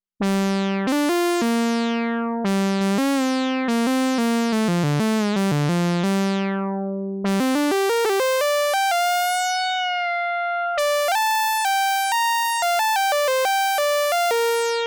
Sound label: Saw Lead 3003